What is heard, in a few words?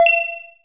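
status alarm; FantasyTennis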